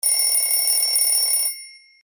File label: alarm new